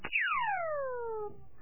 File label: arrow Pew; trogdor canvas